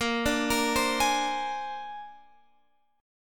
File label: A sharp 9th A sharp Guitar Open F; arpeggiated